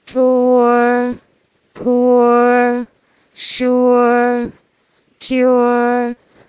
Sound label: singing